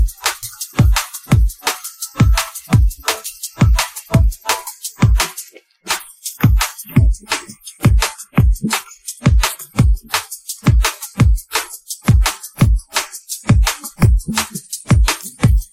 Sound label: drums